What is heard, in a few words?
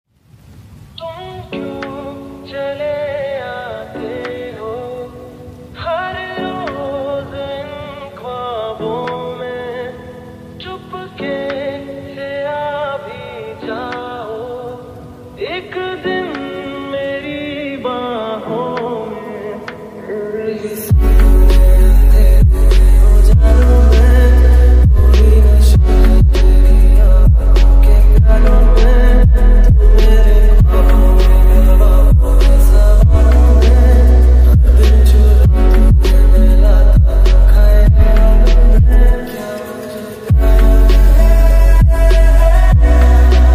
Lofi Remix